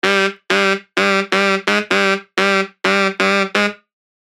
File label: Sax, dirty dutch leads; Spire; revealed spire dirty dutch leads vol 1